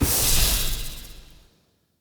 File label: Heal; Banneret